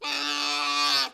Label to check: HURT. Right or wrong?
Right